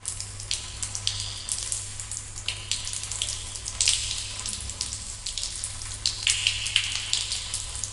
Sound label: waterdrops